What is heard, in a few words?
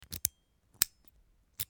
Lock